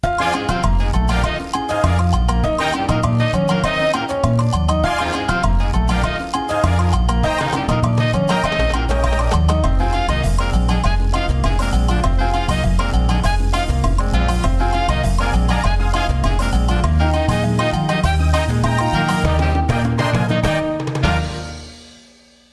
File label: Mambo